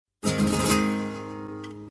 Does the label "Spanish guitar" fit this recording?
Yes